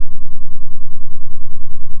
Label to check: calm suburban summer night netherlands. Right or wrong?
Wrong